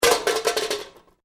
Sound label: Metal Debris Impact Short Medium; Small metal junk crash. Tools, pots & pans or metal parts small impact with rat